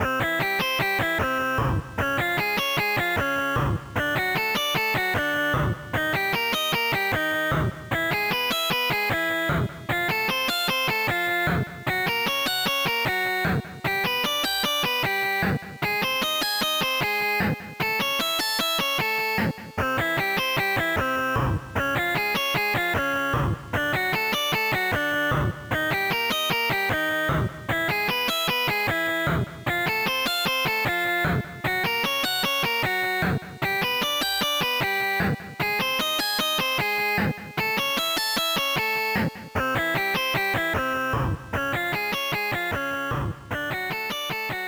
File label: Guitar; Guitar Study Creativity 1